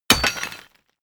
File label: drop metal dirt; hits explosionen; metall